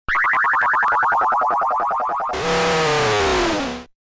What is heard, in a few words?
alert